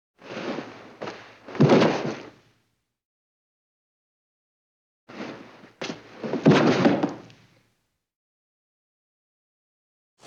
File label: body fall; JERRY APPS WINTER